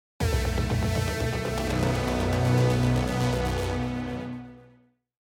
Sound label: Sci fi action energetic logo intro